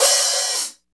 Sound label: hi-hat real; Roland L-CDX-01